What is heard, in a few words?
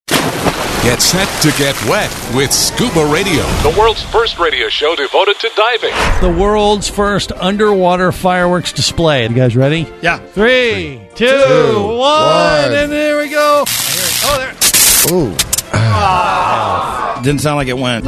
fireworks